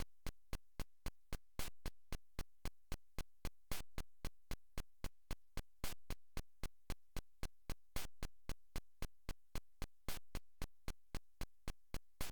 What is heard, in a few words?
Unused Song; Game Boy Controller Kensa Cartridge